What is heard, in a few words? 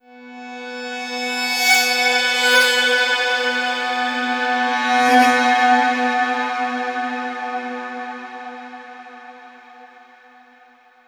Overlay Tone; Act2 Anchor